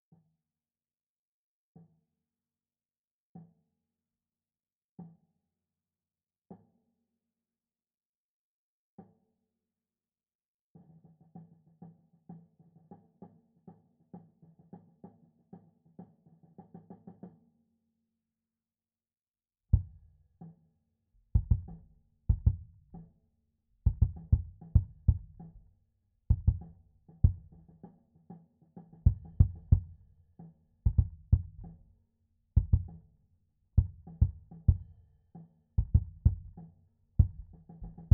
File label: Bass drum SubKick medium